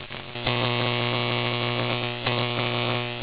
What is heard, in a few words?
electrical current